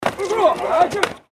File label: football tackling